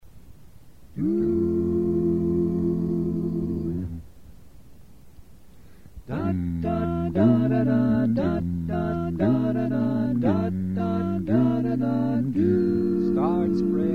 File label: new york ny bass